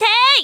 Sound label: kiai; MonsterHunter; Fight